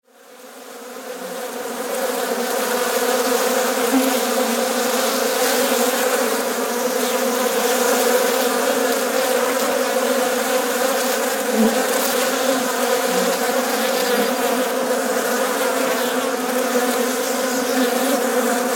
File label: honey bees buzzing